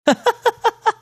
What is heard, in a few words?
short chuckle